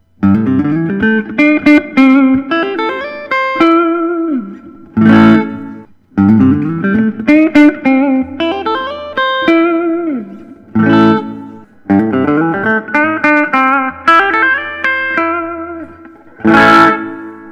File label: gibson memphis es 339 fat neck vintage